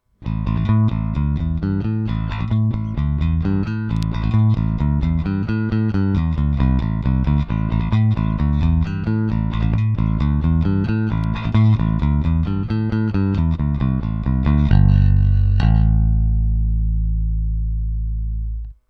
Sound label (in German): equalizer, Bassverstärker; trace elliot ELF